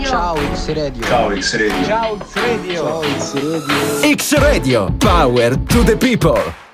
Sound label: Jingle